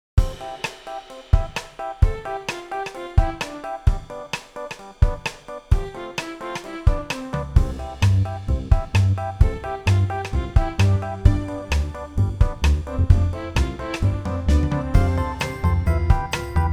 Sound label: Upbeat High Jinks 130